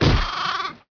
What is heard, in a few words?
sheep die; Zeus